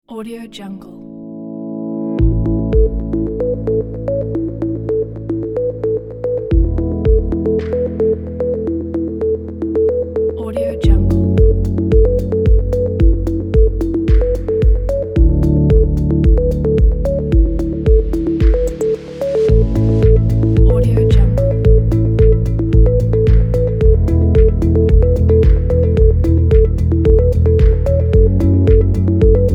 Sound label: corporate intent